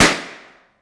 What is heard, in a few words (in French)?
Balon explose